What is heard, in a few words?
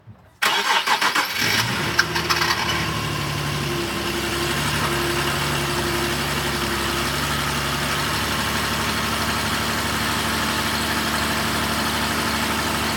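occasional engine rattle during startup unknown engine noise, g37 sedan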